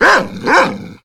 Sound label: attack, dog